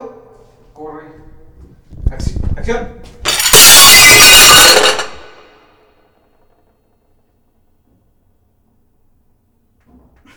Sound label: Dishes Crashing Platos Rotos